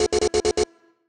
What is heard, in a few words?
Tech Warn